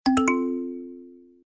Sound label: Kalimba, notifications; Infinix; XOS 10